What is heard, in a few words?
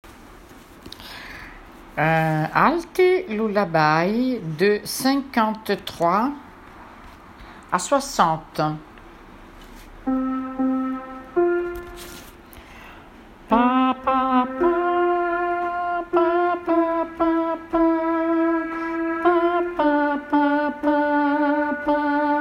alti lullaby; Mesures de 53 à 60